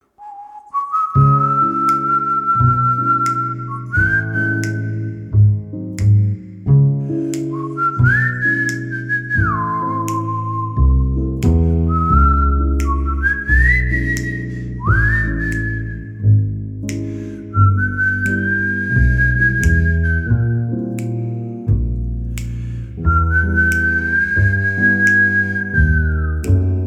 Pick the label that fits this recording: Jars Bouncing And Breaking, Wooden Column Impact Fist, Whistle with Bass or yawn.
Whistle with Bass